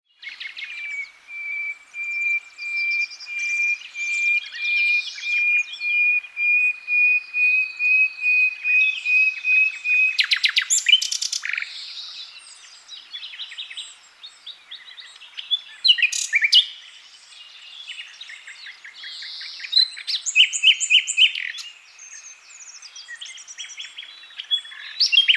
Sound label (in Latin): Luscinia megarhynchos; SETE